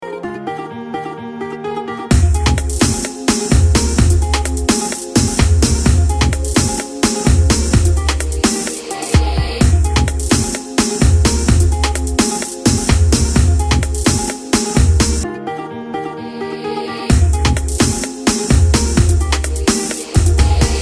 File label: Karaoke Song